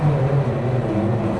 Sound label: starter loop, class 66 train; OpenBVE